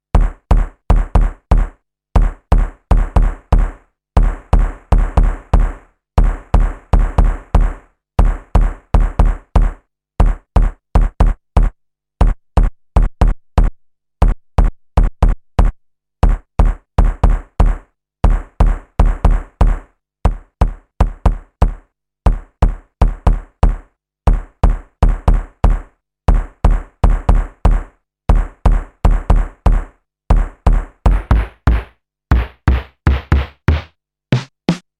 PERC1

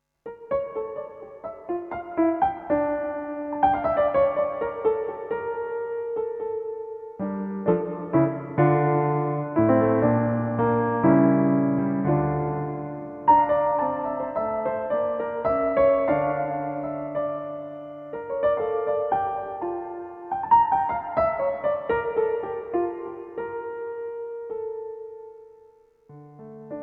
CP1 REV-1 Room

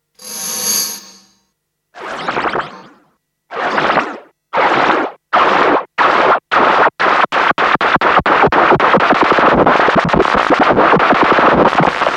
delay

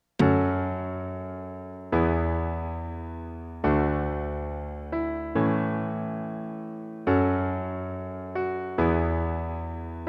Korg EK 50 L Style Time Machine

